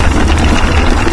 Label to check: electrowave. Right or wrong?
Wrong